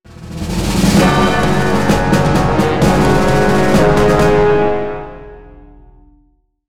Achievement Earned Stinger; Day of Defeat Source

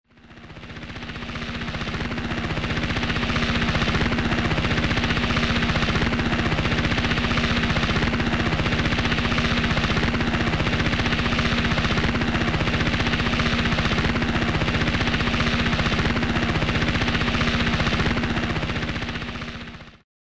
helicopter; cheng's helicopter; Fatal Fury 3 arranged

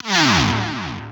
Jingle Rank Down; Udemae

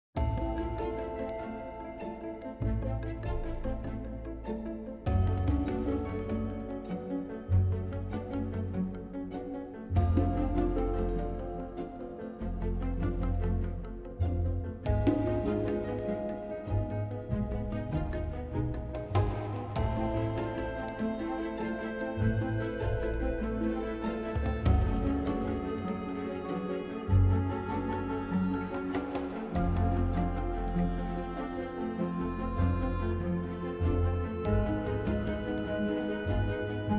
Rotating Room; wellspring

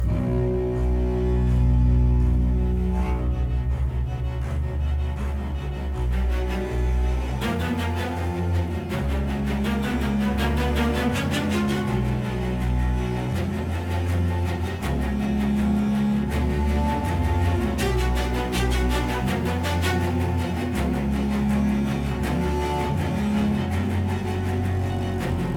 Combat Loop; DLC HarleyPolice; Batman Arkham Knight

